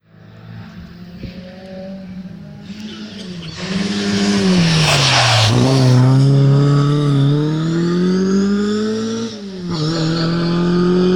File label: Ford Escort RS2000, Road Rally; Guy Woodcock; Bryniau Clwyd Road Rally 2016